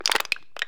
soda can twist; soundscrate

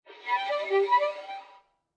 Violin Slide Up Down

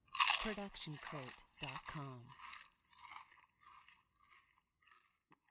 chip crunch chewing open; soundscrate

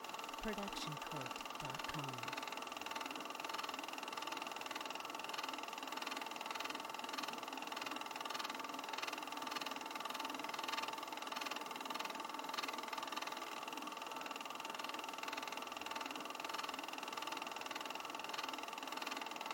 VHS forward long

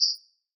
Bird Chirp; SoundsCrate

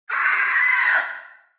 dino screech; SoundsCrate

